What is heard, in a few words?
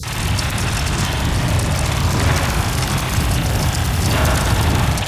ambient battle; World Of Warships